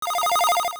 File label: Time Warp Finish; A time-warp in OOA; Zelda Oracle